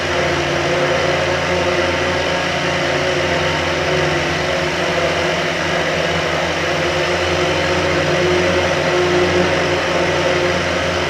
Prop Warehouse Prop Rack Small Move Close; Titanfall 2; Boomtown

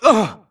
grunt hits; Titanfall 2

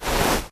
Grunt Mvmt FOL Soft Slow Cloth; Titanfall 2

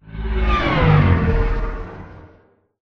deploy from mega; Titanfall 2

